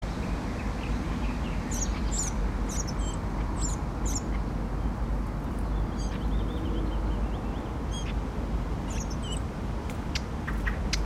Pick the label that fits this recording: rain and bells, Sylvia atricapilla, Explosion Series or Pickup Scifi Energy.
Sylvia atricapilla